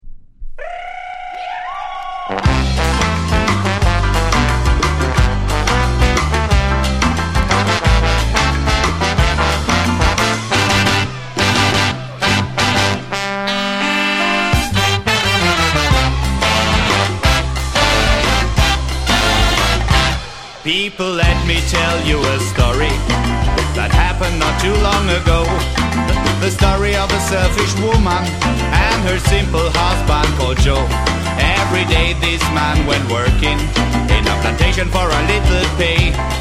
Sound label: ding